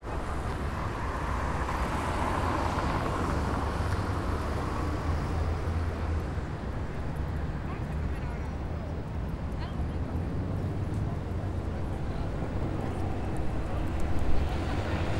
Traffic Cars Rush Hour Bus Walla, Buenos Aires; Wakerone; Traffic X Buenos Aires

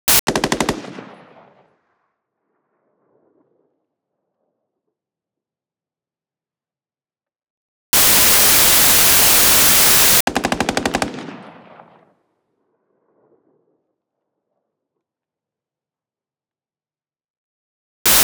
Battle Rifle XM8 Auto Distant Exterior; Watson Wu; Battle Rifles & Pistols

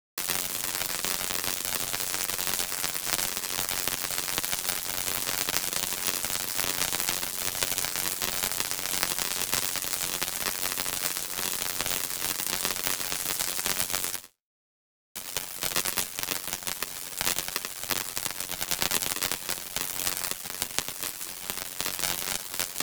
Welding Electric Micro; Wakerone; Electric Deluxe

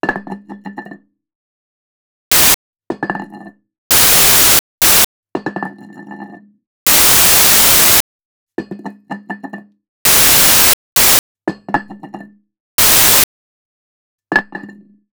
Weight Metal Plate 10kg Dropped, Gym; Bodybuilding; Christophe Davaille; The Gym - Sounds Of Bodybuilding

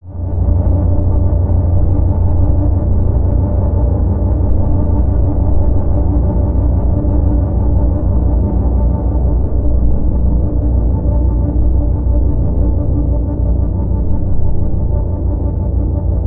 Atmospheric element Tension; MatiasMacSD; DISTORTED PERCEPTION

